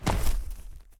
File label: step, cow; mob; Minecraft; Votive World